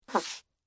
High Heels Scrape, Footsteps, High, Heels, Scrape, Wood, Surface; Footstep Sound Effects; BlastWave